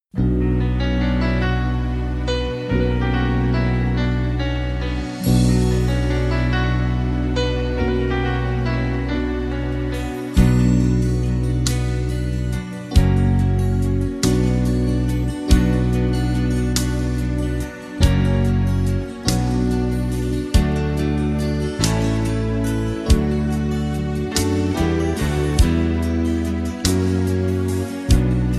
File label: We Three Kings Karaoke